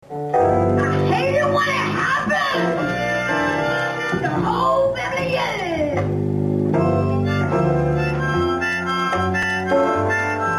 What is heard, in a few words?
student blues